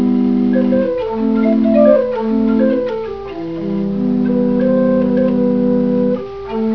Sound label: organ